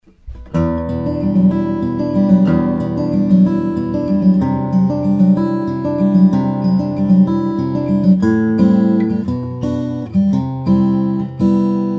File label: van linge pinchnought, steel string guitars, flattop; small waisted dreadnought